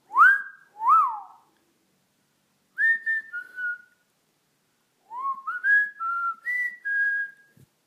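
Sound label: Whistling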